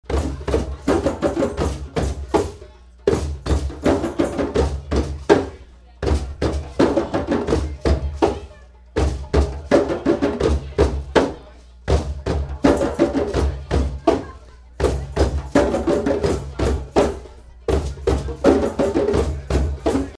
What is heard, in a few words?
Heartbeat, drum circle